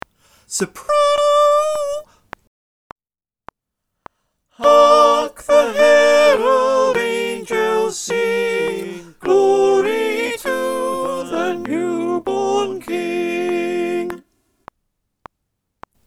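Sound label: Soprano refrain click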